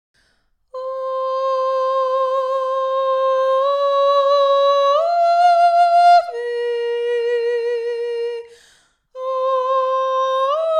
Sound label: ave maria tenor